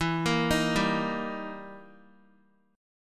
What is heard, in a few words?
E Minor Major 7th Flat 5th, E, down, Guitar; EmM7b5 arpeggiated; JGuitar